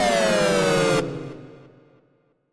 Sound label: drop